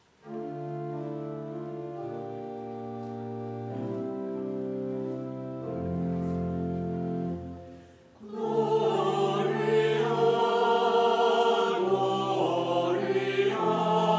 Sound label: Choral Call to Worship